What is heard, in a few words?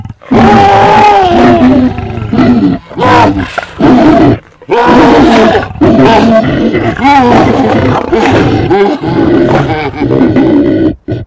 nemesis rage; zombie plague